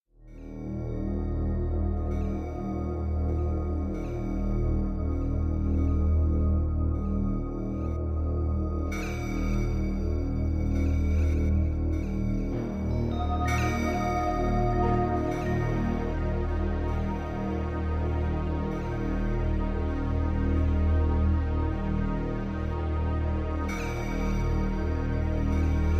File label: Menace Drone Light